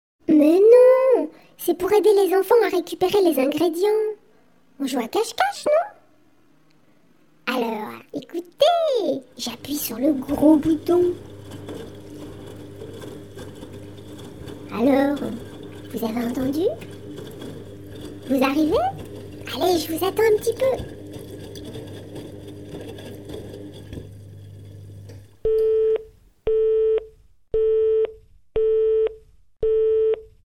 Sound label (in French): machine a laver; tremignon